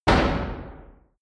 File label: hammer hit